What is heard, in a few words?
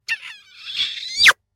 kiss